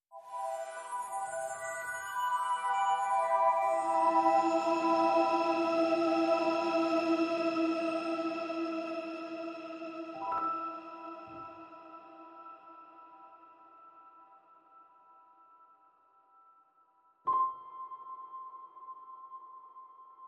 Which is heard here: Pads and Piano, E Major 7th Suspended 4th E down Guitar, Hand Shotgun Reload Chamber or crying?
Pads and Piano